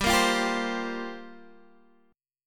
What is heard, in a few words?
G Minor 11th G down Guitar Drop D